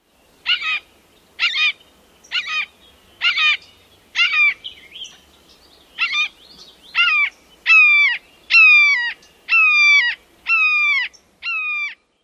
flight calls; KY

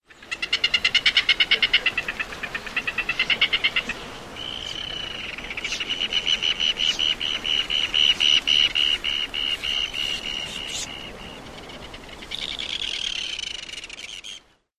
colony calls; St. Helena

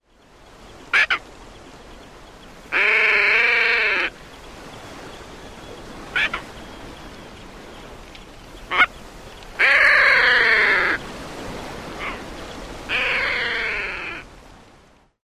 aggressive calls; St. Helena

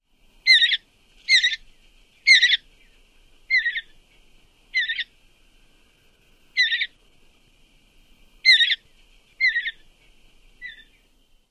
wavered calls

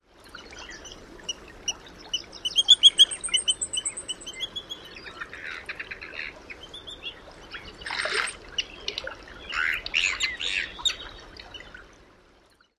peeps given while fishing

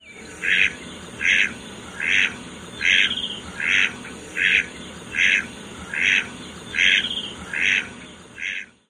calls of male; TX

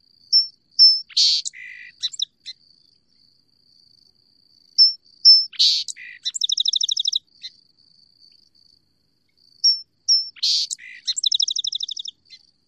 songs

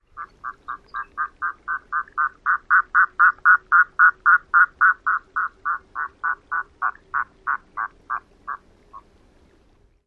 female flight

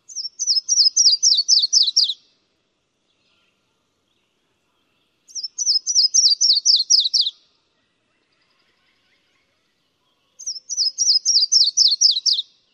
songs

